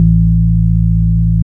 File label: BASS